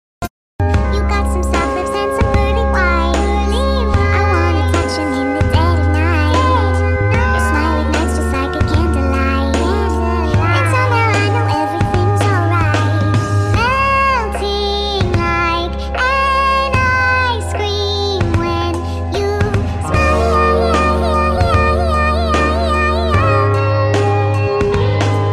sped up english ringtone

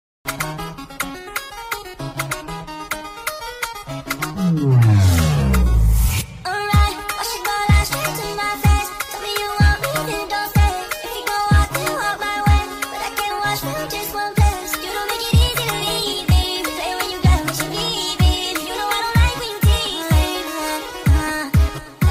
sped up ringtone

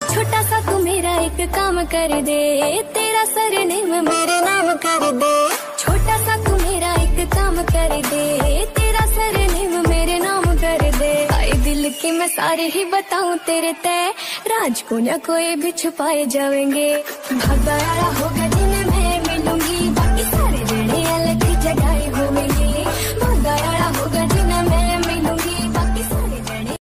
punjabi love ringtones

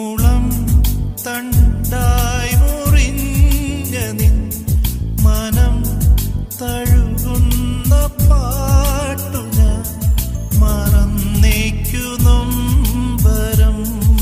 malayalam ringtone

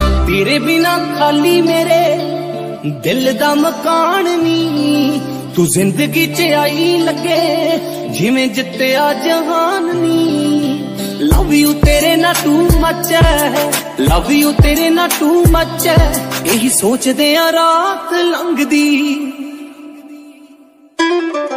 punjabi love ringtone

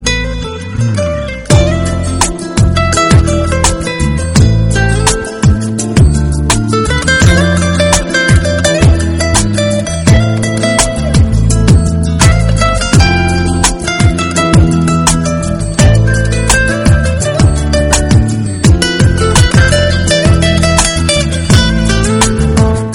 guitar sad ringtone

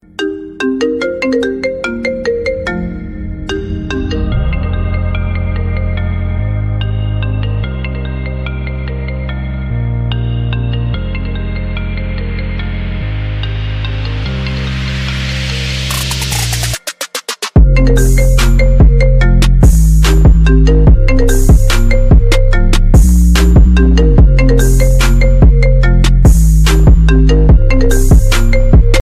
iphone 8 8 plus ringtone for ios mobile; bollywood ringtones

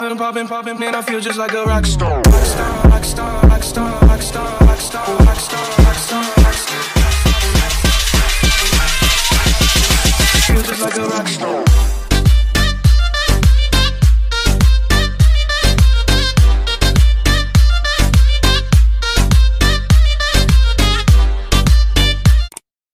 beautiful english ringtone

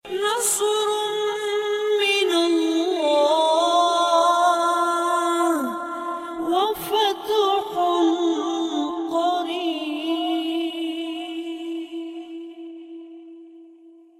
best islamic ringtone

